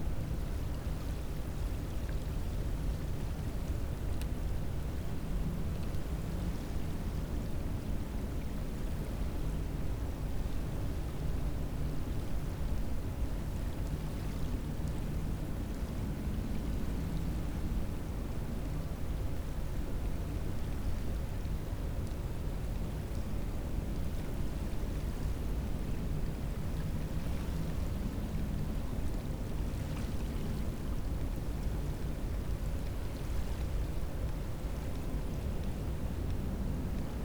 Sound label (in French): cachalot negatif, Physeter Macrocephalus